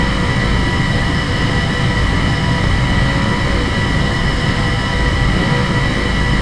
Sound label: engine, aircraft engines